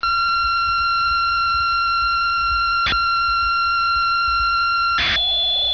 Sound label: turbo